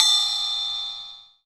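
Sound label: PER PROCU, Procussion, percussion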